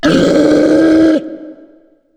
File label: war appears from lava